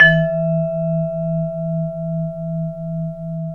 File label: VIBRAPHONE; ILIO